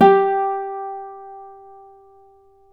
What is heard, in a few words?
Celtic Harp